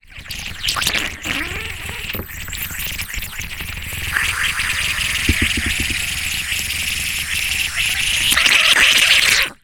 foley balloon deflate release air lip in water bubbles; zapsplat